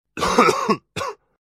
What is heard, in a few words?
cough